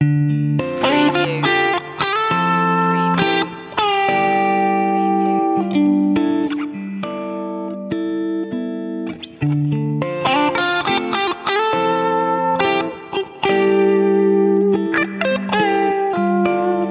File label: guitar game loop